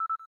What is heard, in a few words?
cam timer last; LG; X power 2